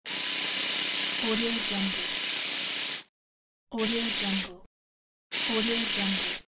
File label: electric razor; bbrealsound